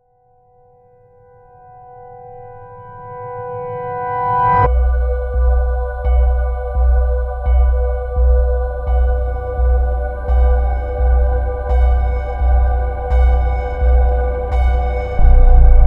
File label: Score Horror; Block B